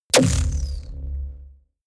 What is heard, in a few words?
sci-fi sucking implosion; schusswaffen